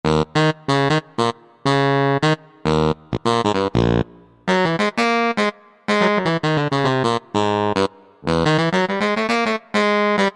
Bari, Yamaha TG500

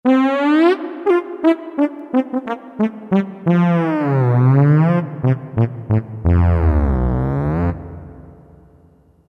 Trombone

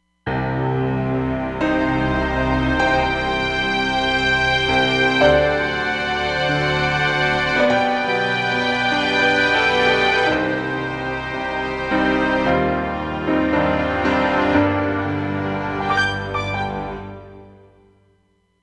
Contemp Orch

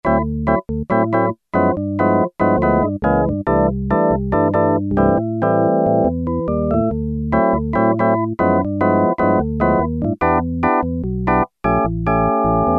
Perc Organ, Yamaha TX81Z

